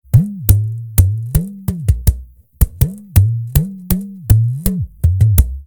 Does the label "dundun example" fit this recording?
Yes